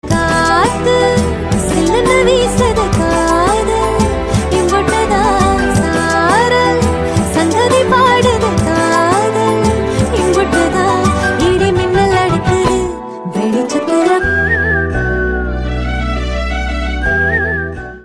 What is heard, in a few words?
Female Version Ringtone